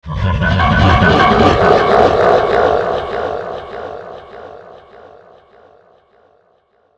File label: Stone Statue